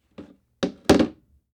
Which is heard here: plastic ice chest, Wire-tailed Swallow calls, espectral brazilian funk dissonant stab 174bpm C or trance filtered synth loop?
plastic ice chest